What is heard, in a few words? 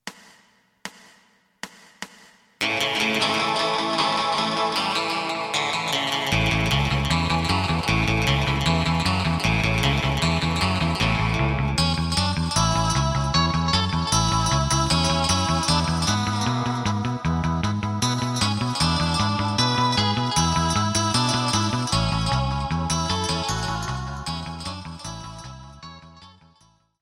drum